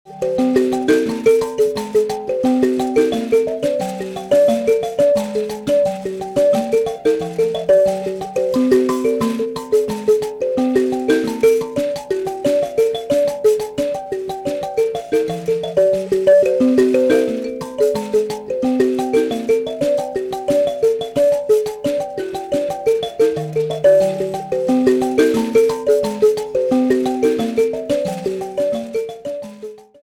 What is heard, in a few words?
mbira